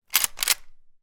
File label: gun marlin 336; still north media